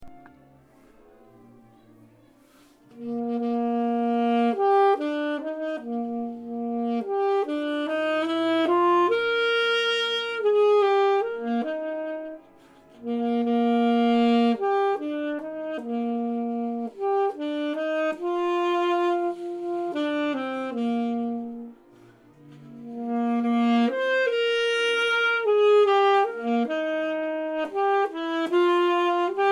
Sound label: alto sax